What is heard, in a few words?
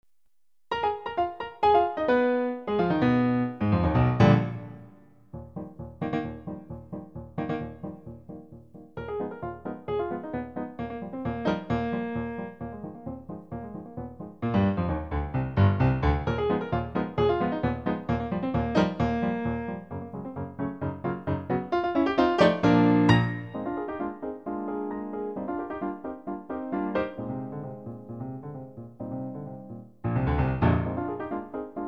es6 concert grand